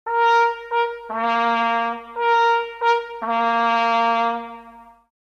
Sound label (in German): abblasen, Jagdhornblasen; Signale; Brauchtum